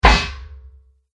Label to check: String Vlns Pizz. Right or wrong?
Wrong